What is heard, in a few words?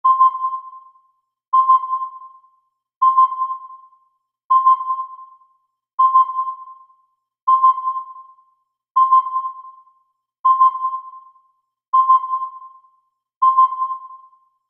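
submarine sonar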